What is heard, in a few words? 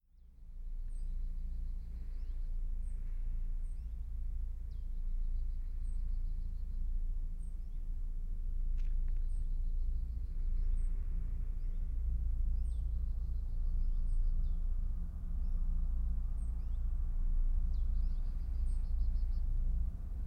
sncb 51 tunnel de botzelaer